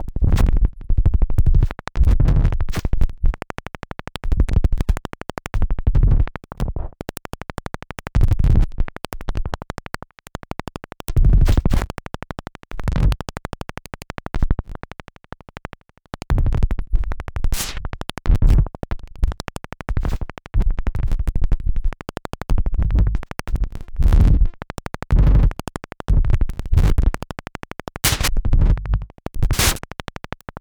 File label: kick explorations